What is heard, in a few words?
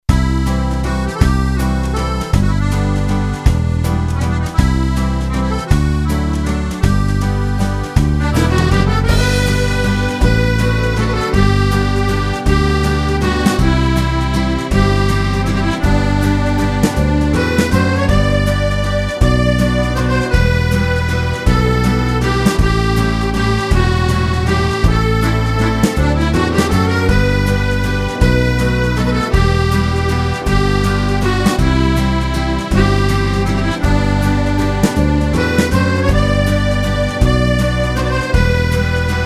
IRISH WALTZ, accordion